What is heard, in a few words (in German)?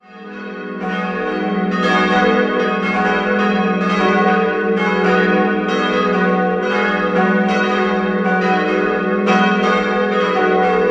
Glocken aus Irsching